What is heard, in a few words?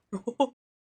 uhoho